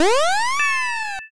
transform

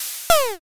sneeze

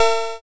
cowbell

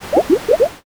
poison

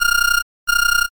black phone

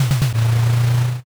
engine start

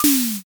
umbrella

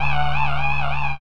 insect swarm

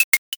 crack

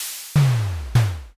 press

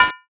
guard

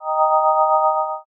magic circle

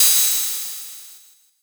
cymbal

